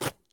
jump asphalt; Escape from Tarkov

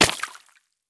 landing puddle; Escape from Tarkov

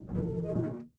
door double metal close start; Rust

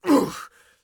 bear2 hit; Escape from Tarkov

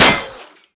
sheetmetal small

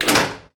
P-51D Door Open; DCS

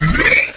dragon shocked

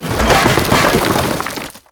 objects, World of Tanks

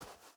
footstep burlap sand walk; Rust

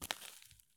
soil walk; Escape from Tarkov

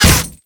glass small meaty

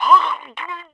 grunt die

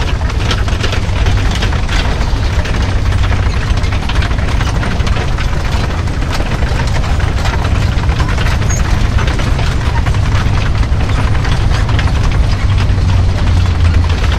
maus tracks, tanks; War Thunder

